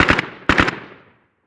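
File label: gun ak47 distant; ModernWarfare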